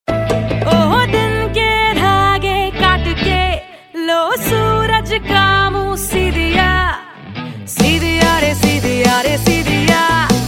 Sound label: Ringtone, Bollywood; Qaidi Band